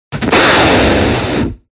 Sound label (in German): Ruf